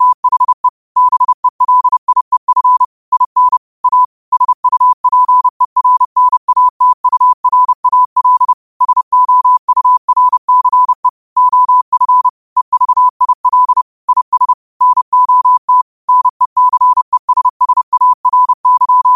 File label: 5 wpm, morse